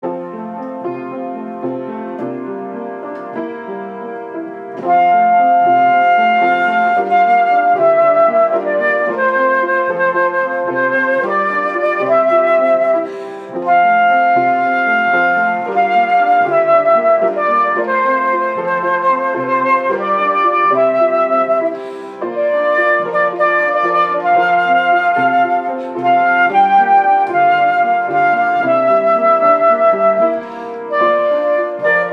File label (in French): Accueil piano et flûte